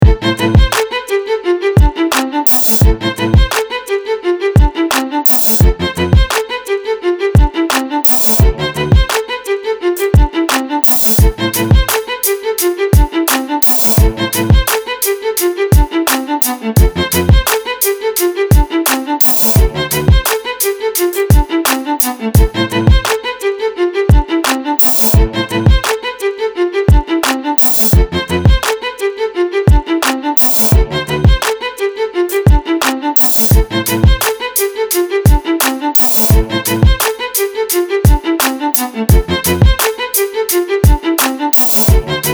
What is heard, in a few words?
Hip Hop Violin Loop